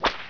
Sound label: WHIP